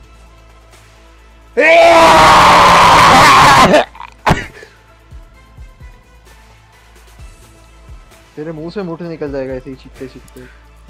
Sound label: sneeze